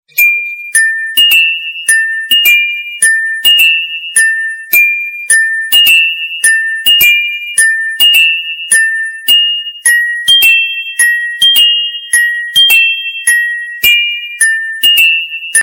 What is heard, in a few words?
bells bells iphone 4s ringtone